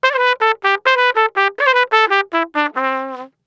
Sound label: Trump Solo, brass